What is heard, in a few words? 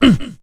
Mmh